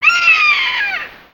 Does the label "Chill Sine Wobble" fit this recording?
No